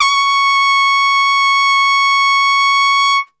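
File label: C#4 trumpet straight, brass; Best Service; Brass Super Section; TRP STRGHT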